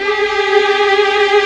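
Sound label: MINOR TRILL G3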